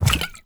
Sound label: jug pickup; ui; inventory